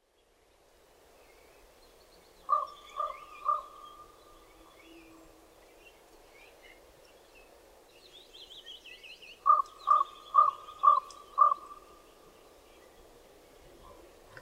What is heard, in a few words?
raven